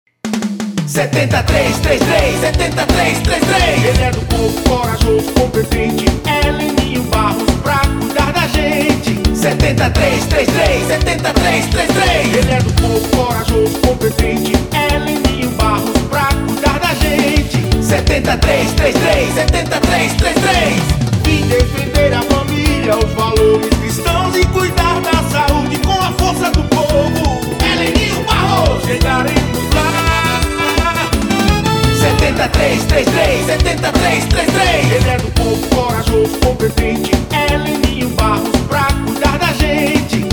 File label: Jingle; Chiclete